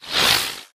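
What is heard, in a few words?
Drone Movement Turn; Titanfall 2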